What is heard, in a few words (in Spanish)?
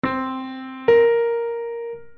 sexta aumentada ascendente; sextas